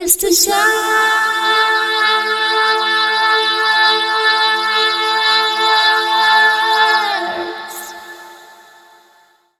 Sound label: VOICE FX, Dance Vocals